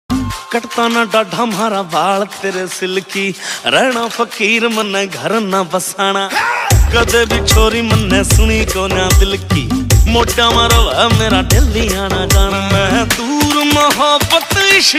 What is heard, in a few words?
haryanvi song